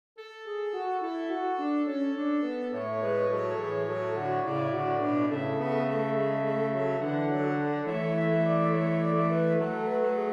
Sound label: Sax Quartet; Bach; Bwv608; In Dulci Jubilo